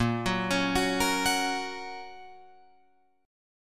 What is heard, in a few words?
Bb Minor Sharp 5th, down, Guitar, Open F; arpeggiated; JGuitar